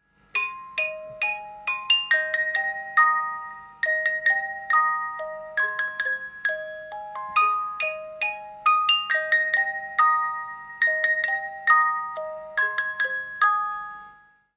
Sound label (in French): boites musique